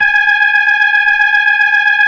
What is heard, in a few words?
G#5F, B3, Vintage Keyboards